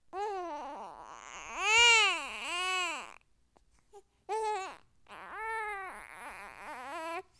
BABY WHIMPER; Into The Woods

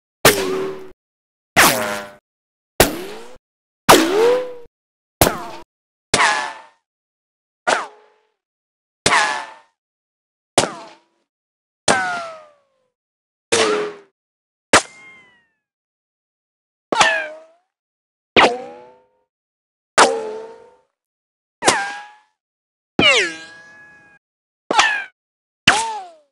Bullet Ricochet Sound Effects

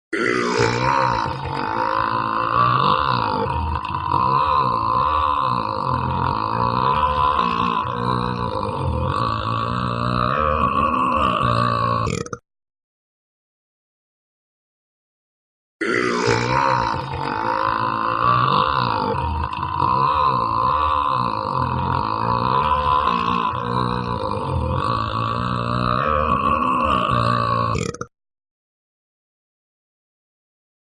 Burp Super Long

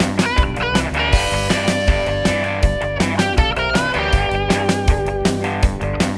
vamp

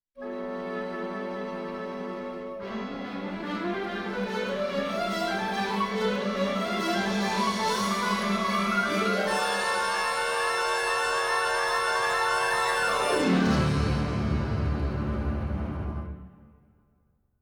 LION'S RUNNING EXIT

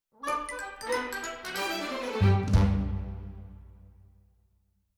SCARECROW FALL

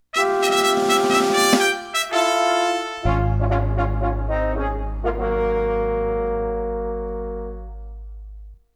FANFARE; Into The Woods

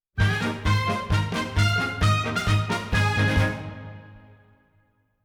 INCIDENTAL MARCH

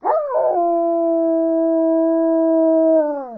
Dog howling sound effect; Dracula

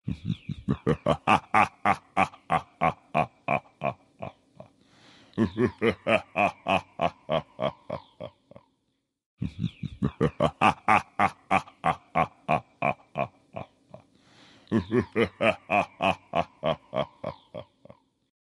Deep Evil Laugh Sound; Dracula

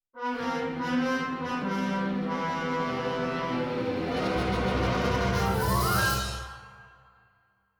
INCIDENTAL WITCH DISAPPEARS

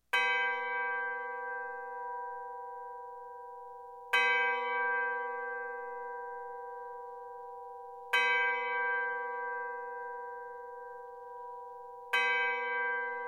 COW DEATH; Into The Woods; ACT 1

